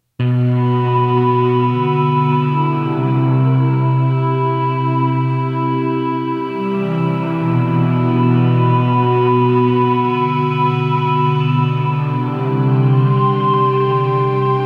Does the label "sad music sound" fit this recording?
Yes